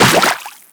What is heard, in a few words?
Run Water, Footsteps; Enemies; Warframe; Teshin